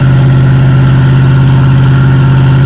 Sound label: v8 diesel small, engines; acf engines